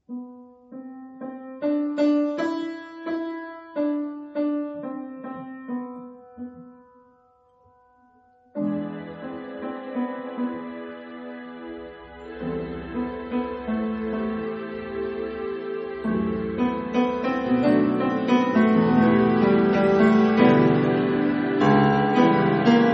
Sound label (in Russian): Ансамбль скрипачей, Ноктюрн; А. Бабаджанян